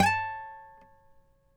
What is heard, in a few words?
FLAMENCO NYL, Guitars; Spectrasonics; Hans Zimmer Guitars VOL-2